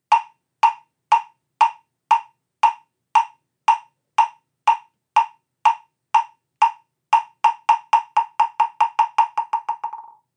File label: Moktak Small